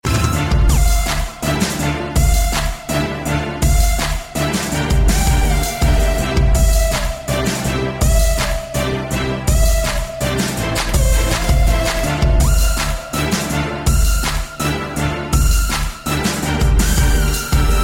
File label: Epic Rnb Beat